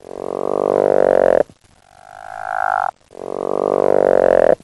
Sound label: Frogs Jungle